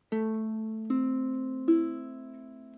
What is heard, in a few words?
chord ace, ukulele